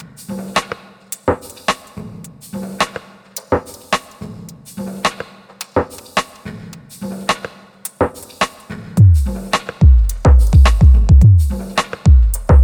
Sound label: break fragment